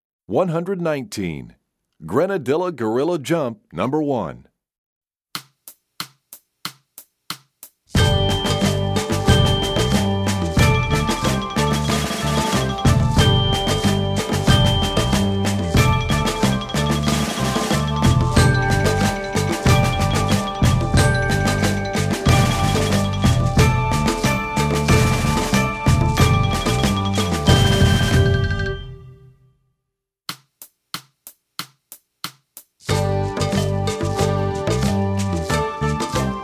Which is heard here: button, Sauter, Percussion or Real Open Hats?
Percussion